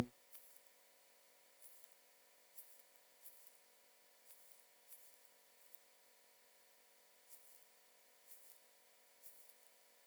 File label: female wing protest sounds